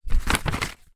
paper notepad page turn; foley; zapsplat

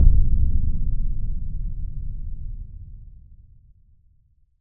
Impact Boom Thud; bottlerocket

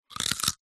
human bite carrot

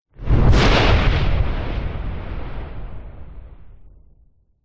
thunder crack designed; nature

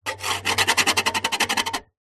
foley metal scrape sharp object rusty old thin

